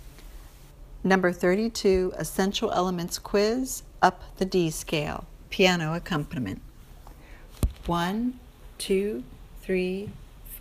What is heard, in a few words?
piano